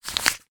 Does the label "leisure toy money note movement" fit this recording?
Yes